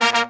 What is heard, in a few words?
Pop & Funk Brass, 120